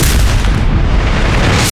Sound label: burst explo, smokestack; destruction; Half Life Alyx